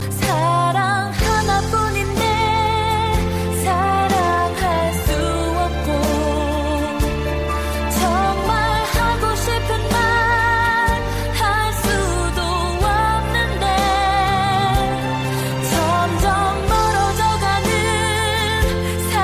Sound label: calling tone ringtone